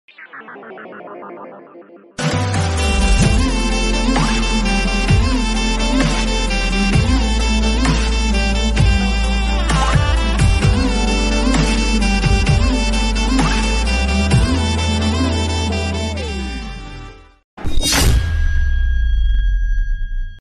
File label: telugu marriage bgm ringtone; telugu lyrical bgm